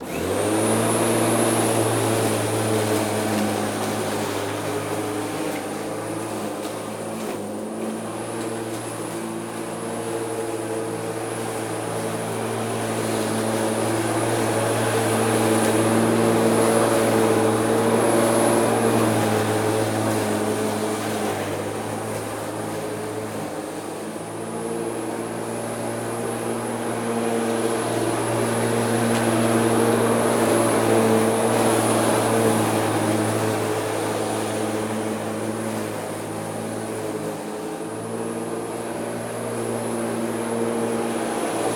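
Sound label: Motor Electric Lawn Mowing, Lawn Mower Ambience; InMotionAudio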